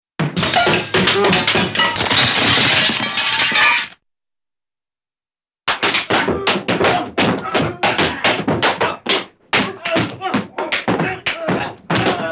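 Objects Fall & Crash with some Male Grunts, cartoon; Hollywood Edge; Cartoon Trax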